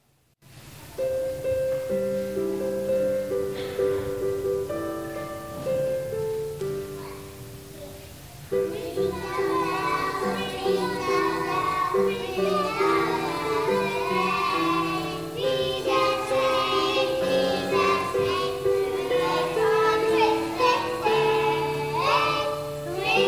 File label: childrens choir